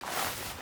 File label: fallen im grass; Kids Games; DribbleKingsFootball